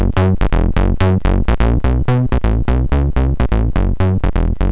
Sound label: Simple Bassline MOS6581R4